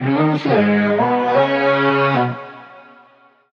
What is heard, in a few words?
vocal emotional Abm; SD AURORA Sample Pack; Slate